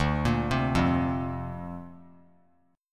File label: D-Minor 7th Sharp 5th D, chord; Dm7#5; arpeggiated